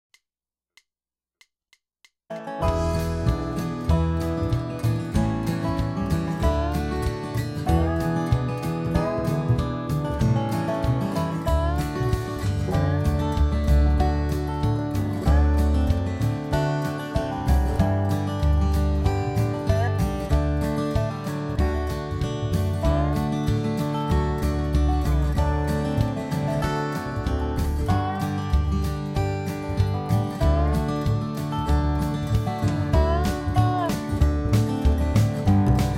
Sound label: Render Drums